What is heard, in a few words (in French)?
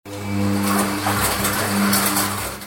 Lave linge; bruitage; Electro-menager